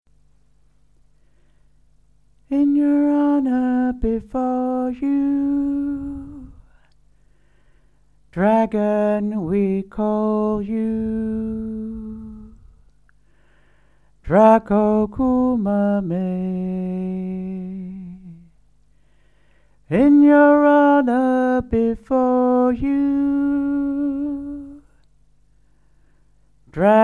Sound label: Dragon calling chant; Dragonrise Witchcamp UK 2019